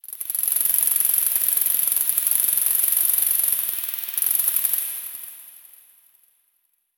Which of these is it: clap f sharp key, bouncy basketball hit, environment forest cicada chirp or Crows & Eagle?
environment forest cicada chirp